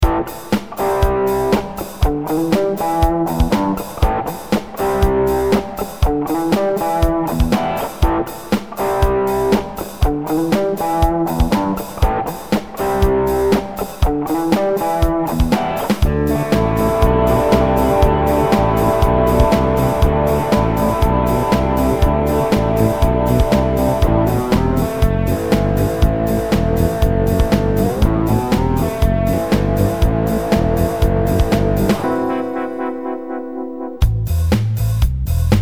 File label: rock